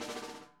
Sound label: SNARE, Interactive Drum Kits